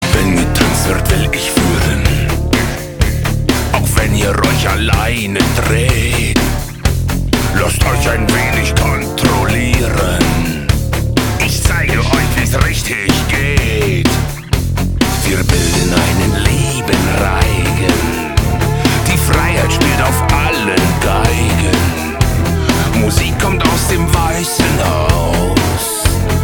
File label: music